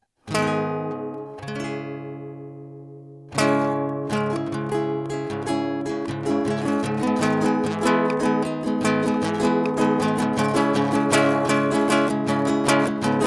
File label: Guitar Soundcheck; The Protest Song